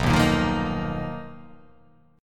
B Major 7th Flat 5th B down, chord shape

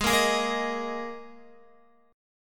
Ab Suspended 2nd Guitar; strummed; Absus2; x,11,10,13,10,11

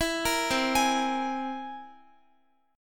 C Augmented 7th, Guitar, chord; C+7; arpeggiated; x,x,14,15,12,15; 4E,4A,5D,5G,5C,6F

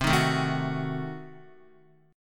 C Diminished 7th C, Guitar 4F,4A,5C,5G,5C,6E; strummed; JGuitar

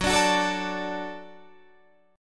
F sharp Minor Sharp 5th F sharp Guitar Standard; strummed; JGuitar

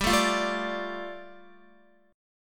G 7th Suspended 2nd, Guitar; G7sus2 strummed

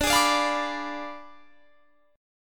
D Diminished 7th D down; Ddim7 strummed

